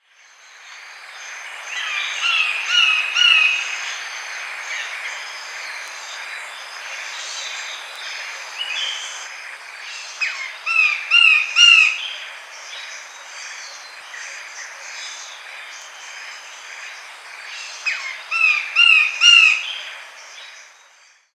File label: checker throated woodpecker